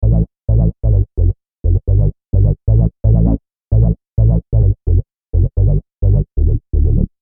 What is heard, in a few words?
bass